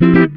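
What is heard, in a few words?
guitar chord funk; Univers Sons; Jazzistic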